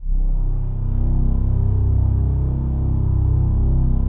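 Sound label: PAD, atmospheric pads; Best Service; XX Large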